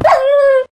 death, wolf; mob; Minecraft

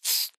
say, silverfish; mob; Minecraft

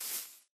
grass, step; Minecraft

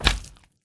magmacube big; mob; Minecraft

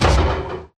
hit, irongolem; mob; Minecraft

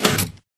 back, piston out; tile; Minecraft

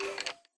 skeleton, mob; Minecraft

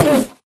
hit, endermen; mob; Minecraft

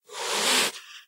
hiss, cat; mob; Minecraft

